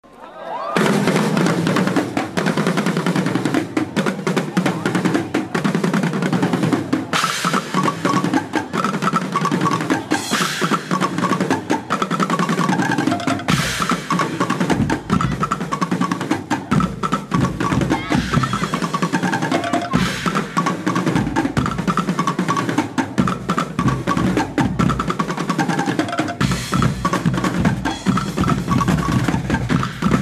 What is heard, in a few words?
Drumsolo